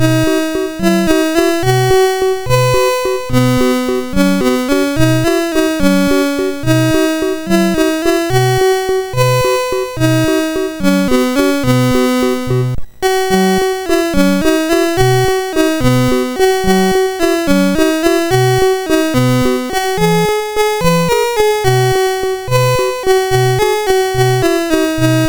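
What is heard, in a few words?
Reversi, MOS 6581 SID chip music, games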